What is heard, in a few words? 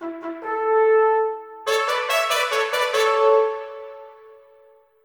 Win Level; Fairy Godmother Tycoon